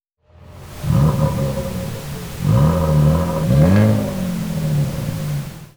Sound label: Rev pink